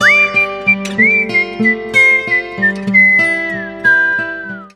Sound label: Whistle Lullabye, Sting